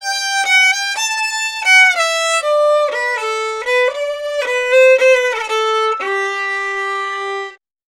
Fiddle, Appalachian

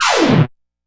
Disco Brass; Synthetic Winds; Dr. Fink's Funk Factory

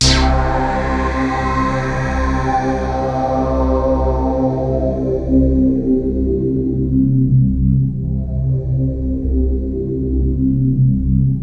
MASTERBASS, SYNTH; Q Up Arts; Dream Experience

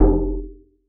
bass tweak error F; Polyend; ARC Noise

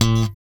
SLP B 3, bass; Spectrasonics; Bass Legends

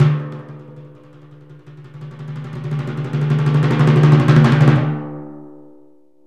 PRC TIMP RO, Timp Cresc; Roland LCDP03

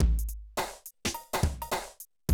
Classic DrumLoops

